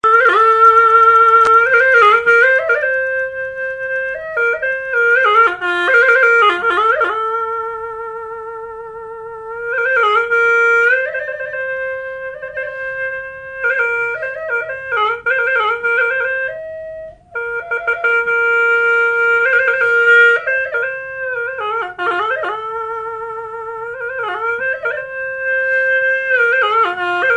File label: Khmer music; pleng khmer